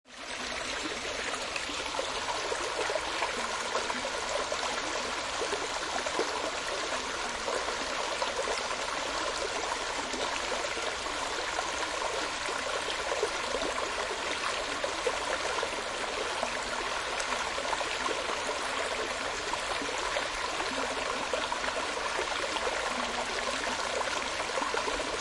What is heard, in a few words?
brook stream flowing water close gentle mineral springs; rd hamilton 3pm